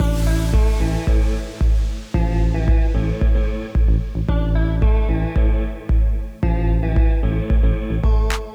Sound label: Intro Loop